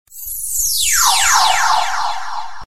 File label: Message Tone